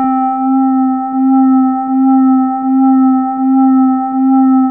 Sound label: toy organ C3, Fairlight CMI IIe, keyboards